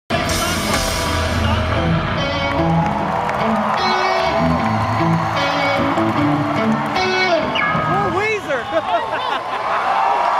riff

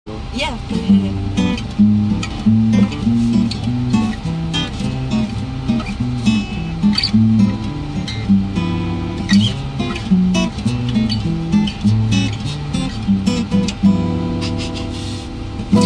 Fun riff

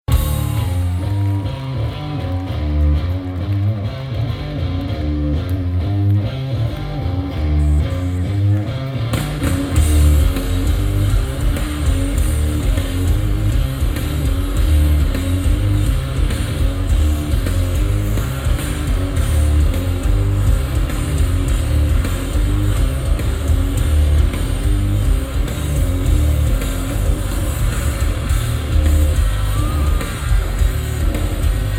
Riff

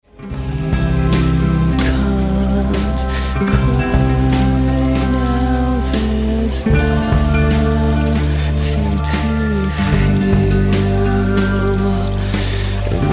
Acoustic

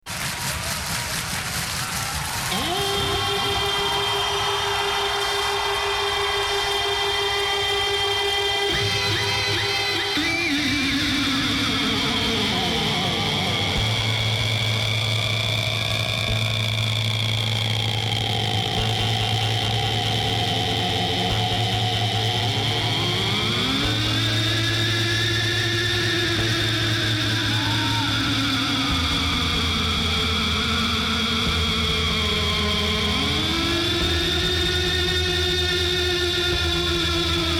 Adagio In G Minor

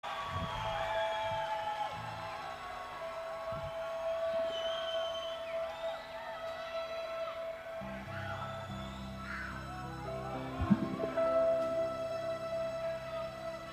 piano intro

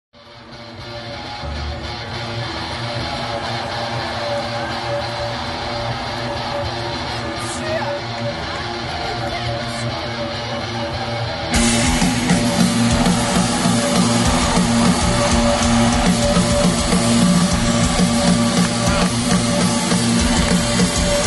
Riff

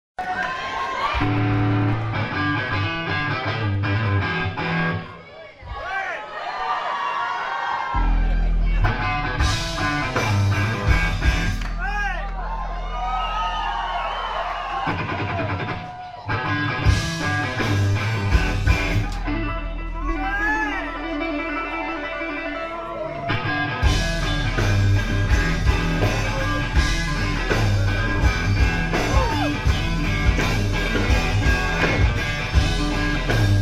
Riff

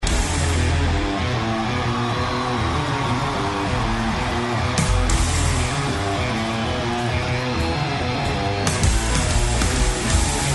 Riff

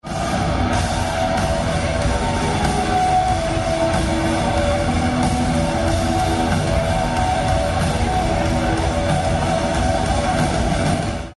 Riff

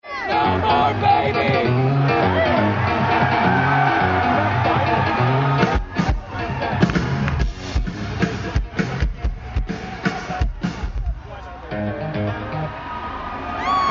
Riff

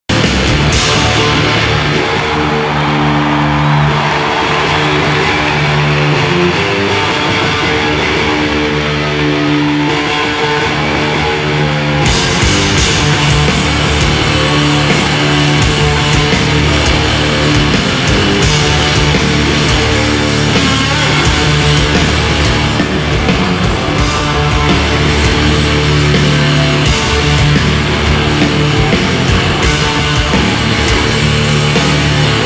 Riff

